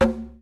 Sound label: small conga